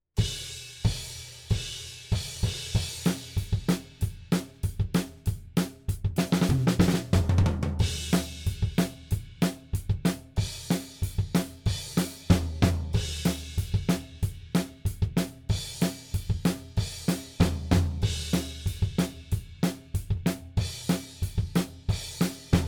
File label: Drums ONLY; Pre Pro